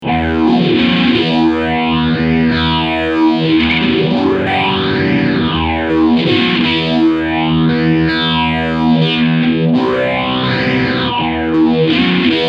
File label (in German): Phaser Reglerpositionen 11 11 17 Uhr Feedback ON mit OVERDRIVE; JHS Pedals 3 Series